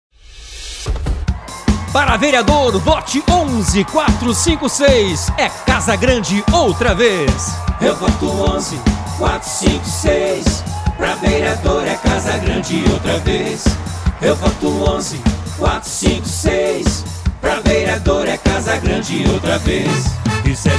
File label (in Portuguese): jingle